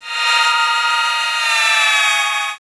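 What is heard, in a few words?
shield down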